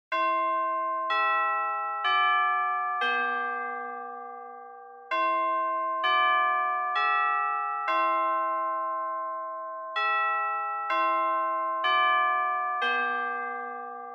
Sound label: Japanese School Bell Slow Long